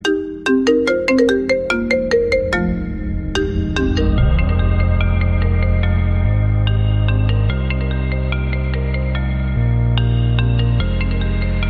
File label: iphone 8 plus